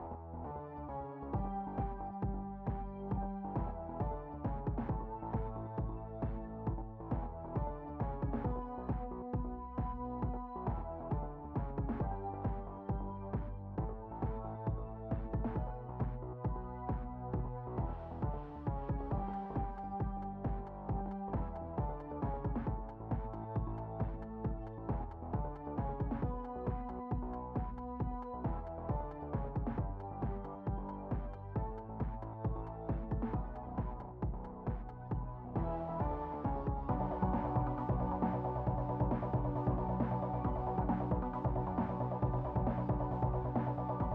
Ambient Bar BGM; Drifting Lands

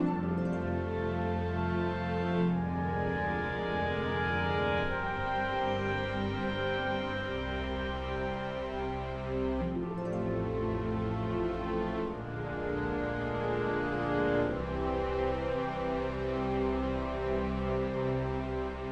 Deception 50bpm Loop; Music Dialogue

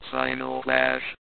final clash; Edge Extended; Android

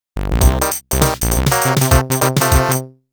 Chill Grill (Jingle); thats so raven psychic on the scene

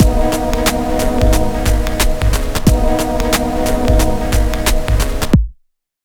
Start; silent hill; it came from silent hill

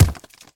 Rock Impact Big; Puddle; puddle plus ios gamerip 2018

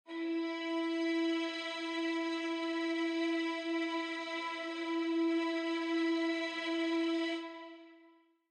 Note E3; Forest SFX

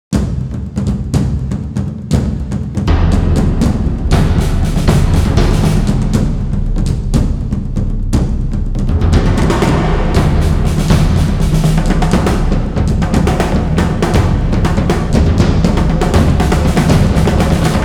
Percussion Mix; Dragon's Crown; Dragon's Crown Pro Original Soundtrack

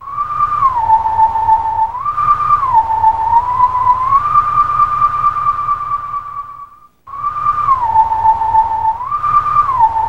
Howl Song, Howling Stone; the legend of zelda twilight princess

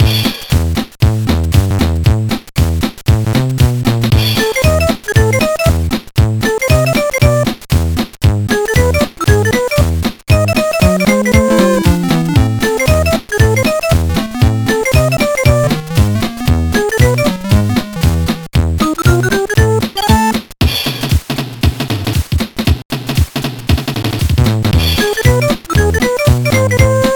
Tropical Treetops; DK King of Swing; GBA

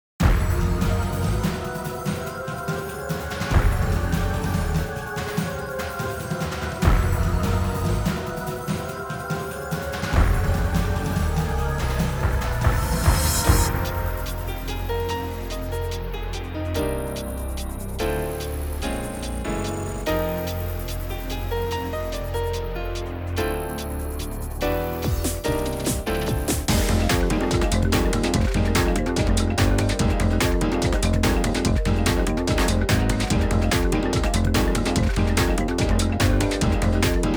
BGM Shooting Cave; Shooting Cave LP; Gal Gun 2; PS4 Switch Windows gamerip 2018; VRGG

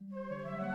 Suspense Start 50bpm; Music Dialogue

